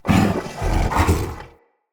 Corrupted Battle Dog Attack Breathe Snarl Active Walk, Animals, Vocals